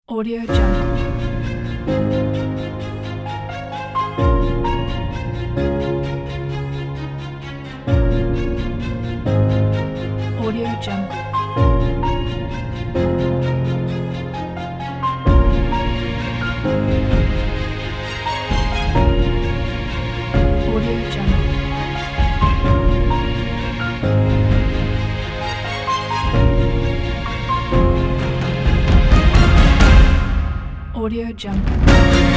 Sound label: epic background pack